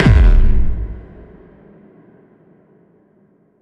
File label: FX Kicks